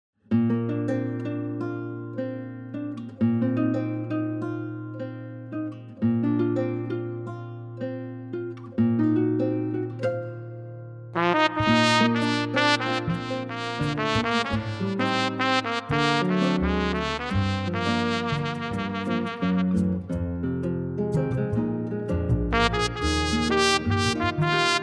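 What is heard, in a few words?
Bb play trumpet reference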